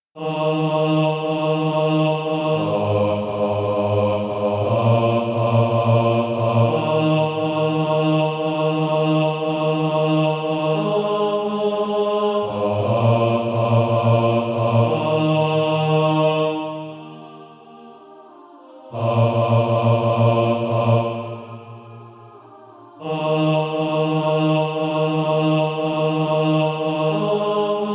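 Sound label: bass, SATB Practise Music; Draw Me to Thee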